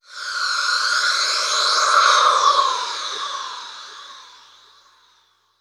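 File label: white sand, noise sweep; Spectrasonics; Bizarre Guitar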